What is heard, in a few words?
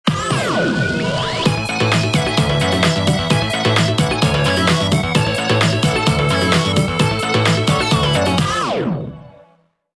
Boombox